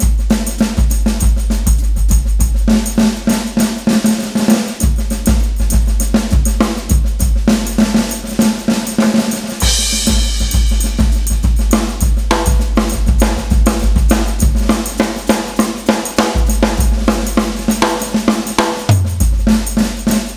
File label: 2nd Line Drums